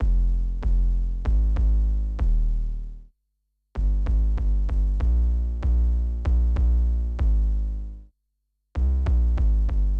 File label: BASS, viral hiphop